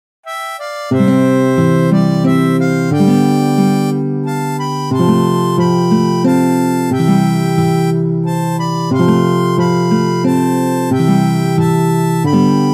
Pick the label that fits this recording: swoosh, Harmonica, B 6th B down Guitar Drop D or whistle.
Harmonica